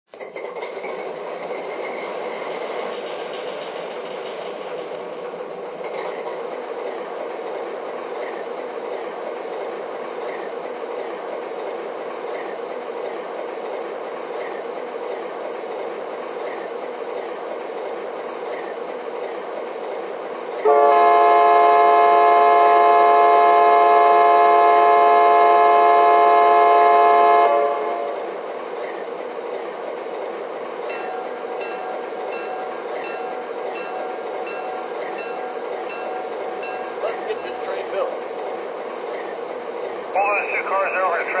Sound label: RS3, trains